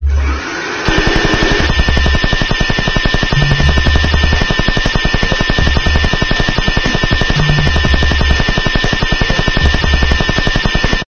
minigun

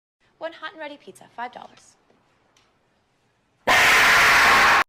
scream; stalker

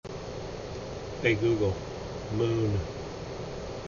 light off

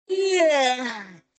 yawn

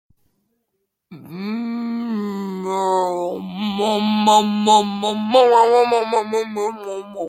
ooooooohhh

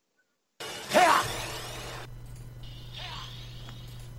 hyah

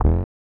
stomp; dk country

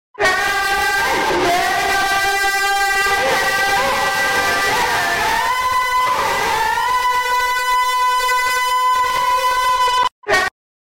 yaaaaaa iaaaaaaa

